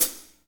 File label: HH, Kits, Rev